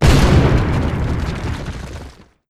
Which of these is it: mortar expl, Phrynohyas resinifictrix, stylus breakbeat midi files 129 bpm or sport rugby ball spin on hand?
mortar expl